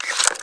sg550 clipout, weapons; cstrike

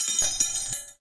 m249 chain, weapons; cstrike

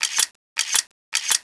generic shot reload, weapons; cstrike

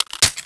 p90 clip in, weapons; cstrike

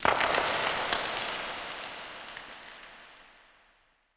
dirt slide reverb, mines; cstrike

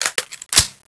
mac10 clip in; weapons; cstrike

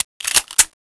clip in, weapons; cstrike

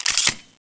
elite clipout, weapons; cstrike

